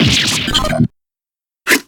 surprised

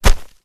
newspaper; scuttlebutt

